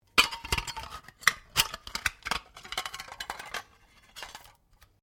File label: Can Squash, various metal cans; Materials